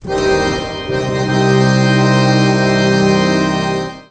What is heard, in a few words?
harm toot, Musical Instruments